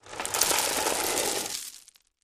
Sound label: Landscape Rock, rocks & dirt